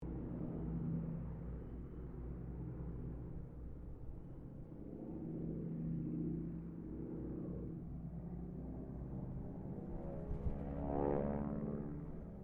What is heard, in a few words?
AIRCRAFT HARVARD SQUADRON 3 PASS DISTANT; Mixed aircraft squadrons; Airplanes; Vehicles